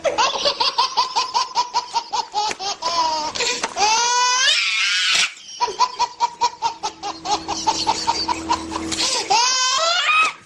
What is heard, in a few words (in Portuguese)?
risada bebe